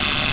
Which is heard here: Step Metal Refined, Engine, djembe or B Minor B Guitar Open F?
Engine